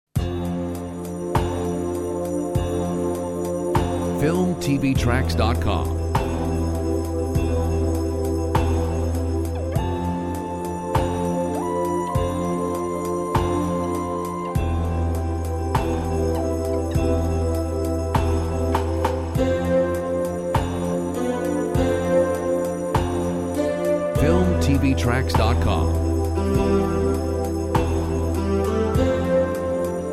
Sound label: Bio tech